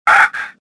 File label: pain; swamp2; Star Wars Jedi Knight II Jedi Outcast; XBOX